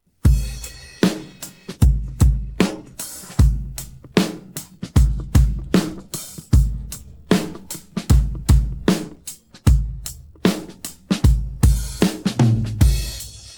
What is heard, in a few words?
pattern, drums